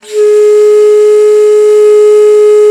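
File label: bass bansuri, World Winds; E-MU Producer Series Vol. 7 – Old World Instruments; World Combos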